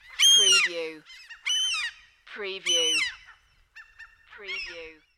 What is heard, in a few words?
Oceanside Seagulls Calling